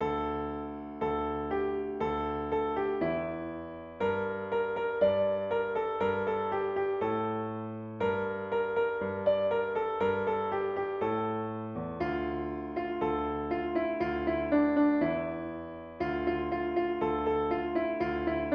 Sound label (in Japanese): 通りゃんせ（ピアノ）